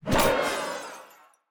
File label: ui riot begin; Shelter